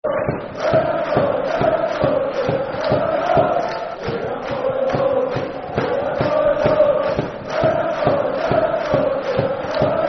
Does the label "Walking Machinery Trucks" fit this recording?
No